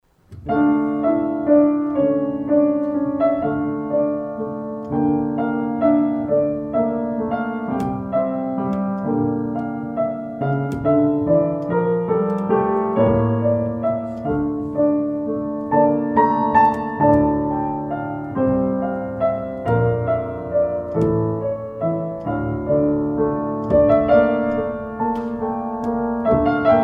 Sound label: Hindu Melody